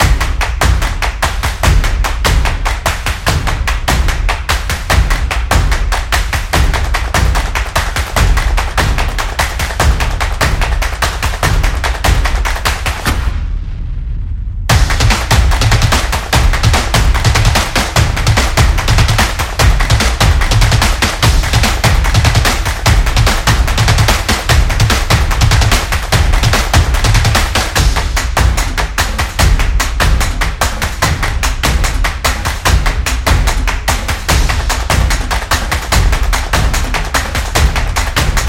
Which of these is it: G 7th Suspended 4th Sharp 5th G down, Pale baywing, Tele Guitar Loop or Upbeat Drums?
Upbeat Drums